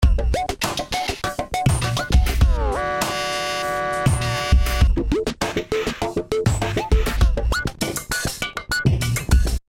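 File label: Beats, This funky feel is produced by using COSM’s Amp Simulator and the Lo-Fi Processor.; V-Synth; Chikutaku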